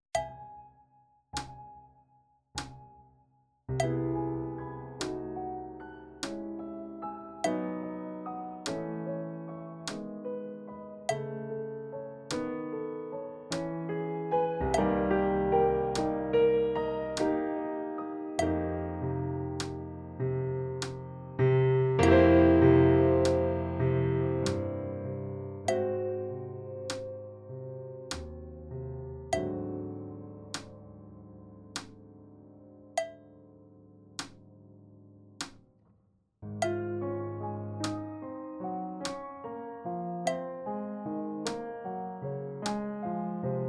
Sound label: Piano Medium; Uebungs-CDs; Playbacks